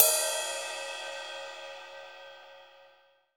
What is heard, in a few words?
CYM, Dance Drums